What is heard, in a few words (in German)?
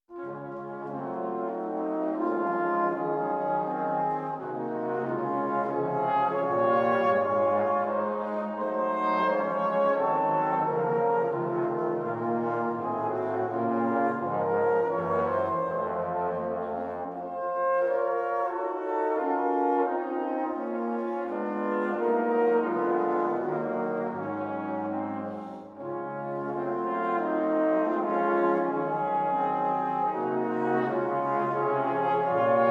Brass Quartett Moderato